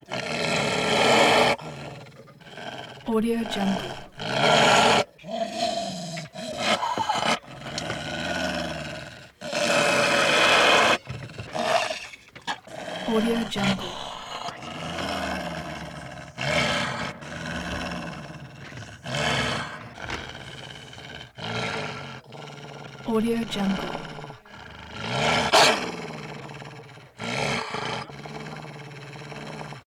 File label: wolf growls; غرش گرگ خشمگین